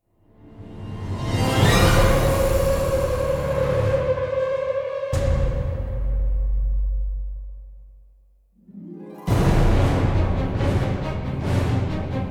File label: Requiem, No Choir